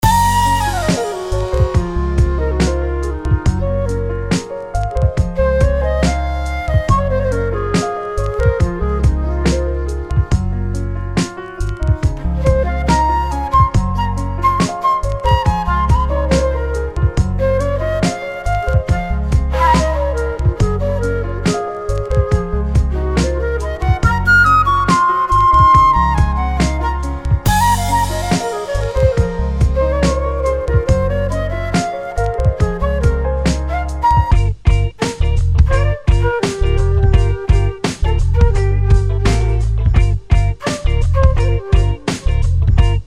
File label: Jazzy Flutes